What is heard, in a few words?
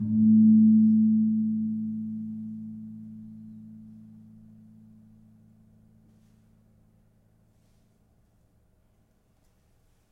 Kempul Pl 4, gamelan; gravityharps; timbrebox